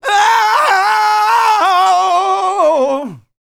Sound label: R ROCK, MALE HUMAN; ILIO; Vocal Planet